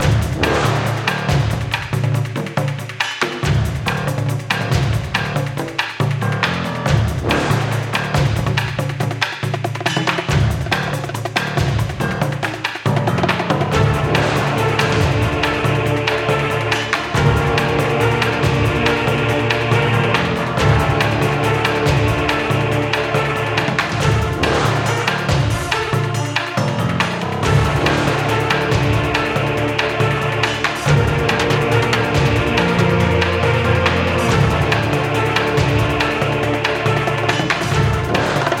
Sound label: Music - Boss Loop; Smashy Road Arena android ios 2016